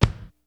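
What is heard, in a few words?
kick, Hip Hop DRUM; InVision Interactive; 40 Oz Phat Slammin Hip Hop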